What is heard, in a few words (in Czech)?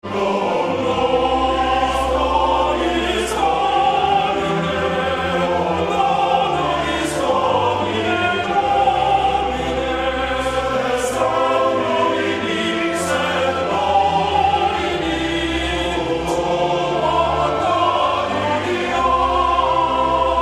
hymna radu